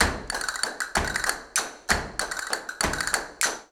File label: CASTANETS, Flamenco